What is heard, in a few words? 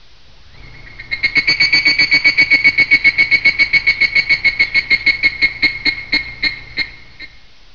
plover; svencoop